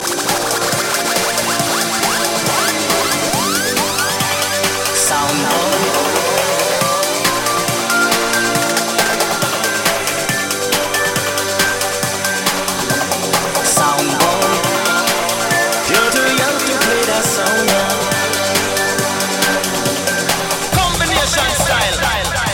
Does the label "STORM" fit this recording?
No